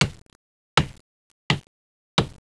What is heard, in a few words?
lumber mill, games Caesar III